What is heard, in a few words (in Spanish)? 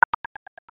tiro